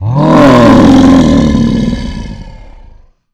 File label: melee; agrunt